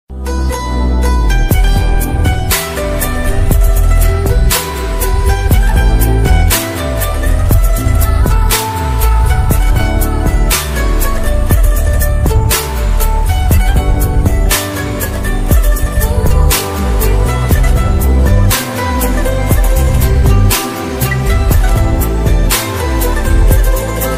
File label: love instrumental ringtone pakistani drama ringtone; fitoor